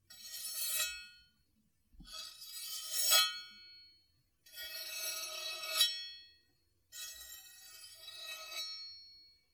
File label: Sword Fight Metal Swords Slam